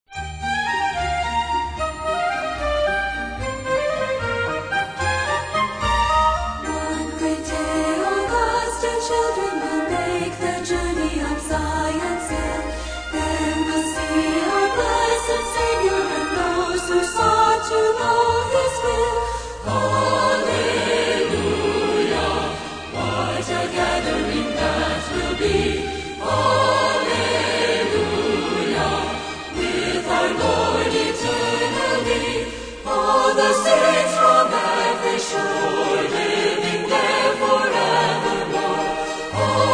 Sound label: Choir And Orchestra